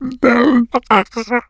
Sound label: become alert, infected; npc, alert, becomealert